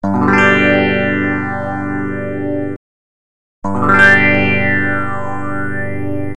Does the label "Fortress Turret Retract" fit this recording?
No